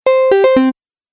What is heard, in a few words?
Stage Level Complete; Video Game Sound Effects